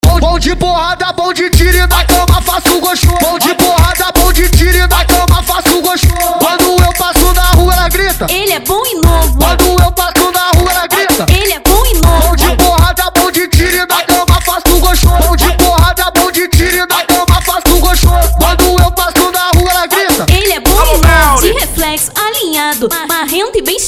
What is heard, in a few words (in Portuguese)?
ARROCHAFUNK 160 BPM